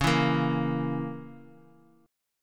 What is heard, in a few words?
C sharp Minor 6th C sharp; C#m6; strummed; JGuitar